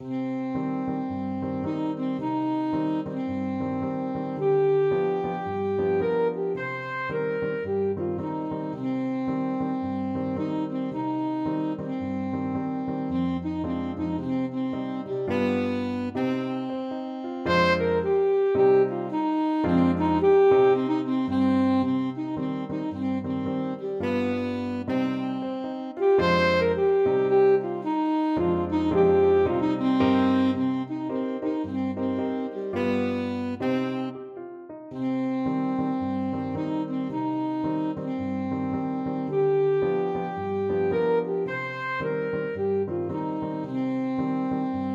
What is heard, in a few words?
wade in the water, saxophone